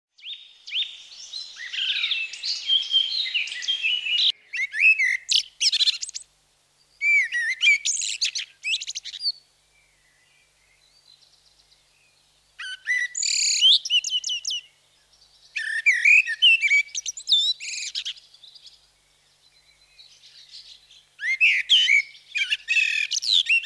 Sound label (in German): Fantastische Vogelstimmen